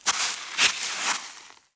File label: grass